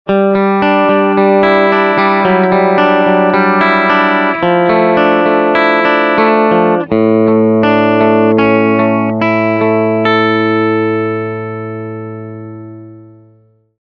Chimey Orange CS50 PPC212OB; Rock N Roll Relics Thunders Gold

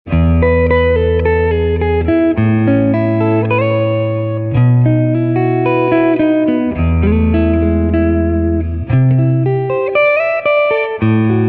Blue Steel 10 46; Dean Markley

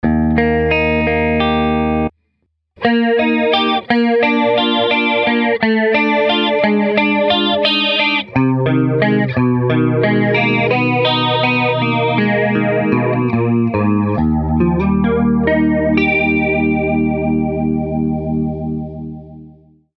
MXR Micro Flanger Fast PRS SE Custom Vox AC15

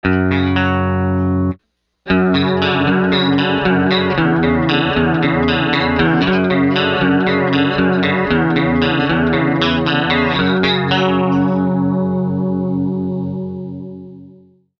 MXR Micro Chorus G&L ASAT Roland JC120

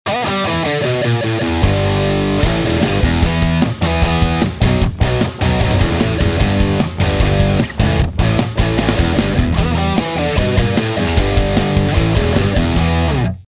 Power Pop Punk Jensen Electric Lightning

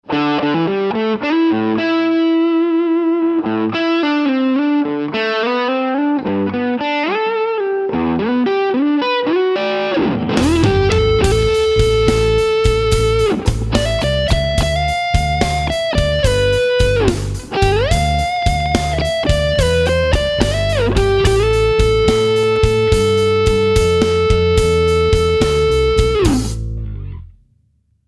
ODS Blues Rock Electro-Voice EVM12L Black Label

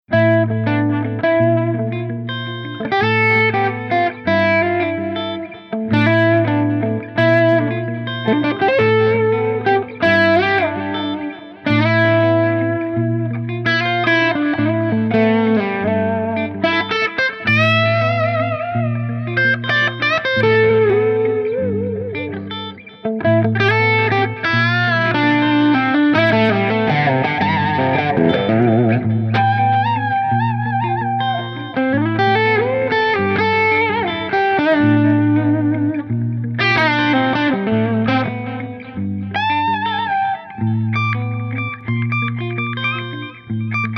Jackson Soloist Boss dd20 Bogner 412ST; THD Flexi50

